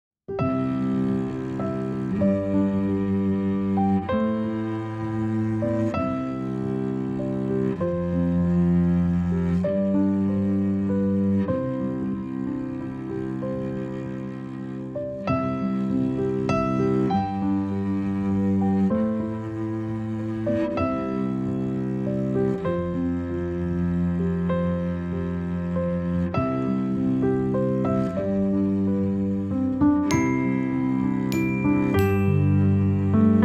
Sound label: Backing; jardim infancia